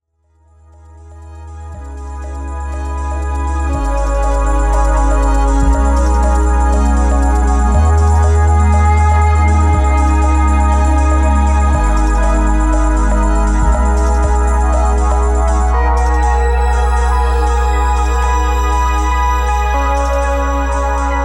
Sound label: Birch - featuring (flute)